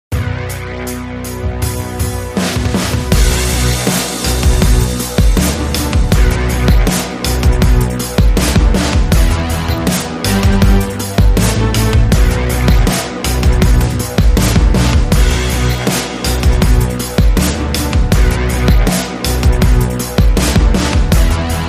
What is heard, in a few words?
Dramatic Tomb, Rhythm